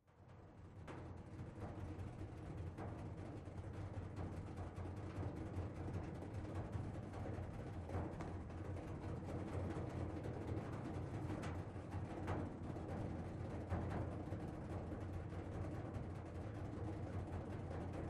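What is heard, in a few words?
Metal Rumble Sweetener, train int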